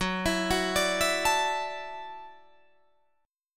F sharp Minor 7th Sharp 5th F sharp, Guitar Drop D